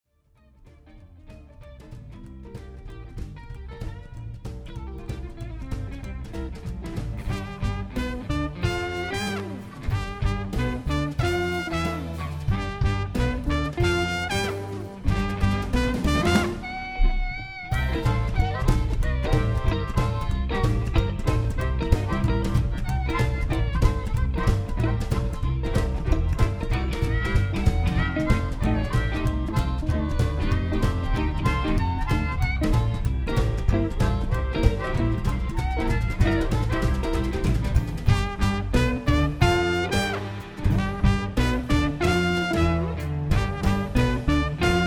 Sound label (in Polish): akustycznie live fragment